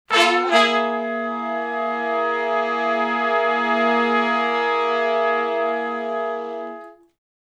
Shuffle Blues End Chords [bII, I] (F#), Horncraft for R&B; Sections; Crimson Blue and Fabulous Horncraft 4 RnB